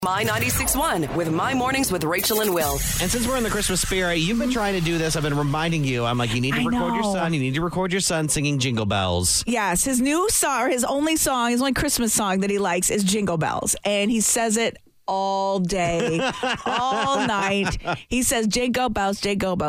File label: little boy sings jingle bells